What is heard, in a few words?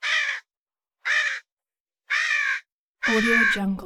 bird crow